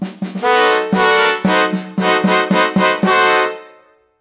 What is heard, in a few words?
create a victory fanfare, samba